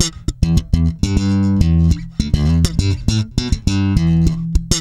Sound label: E, hard funk, bass; Spectrasonics; Bass Legends